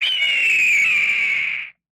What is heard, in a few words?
eagle screech